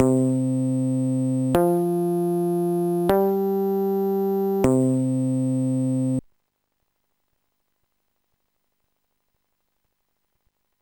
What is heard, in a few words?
bass; Sonix Music Driver